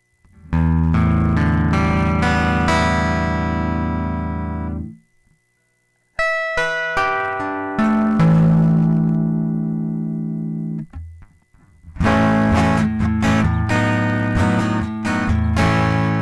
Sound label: 2kHz